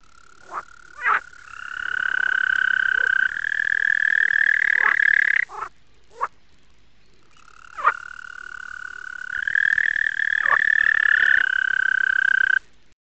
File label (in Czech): ropucha zelena; obojzivelnici